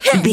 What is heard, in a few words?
voice female attack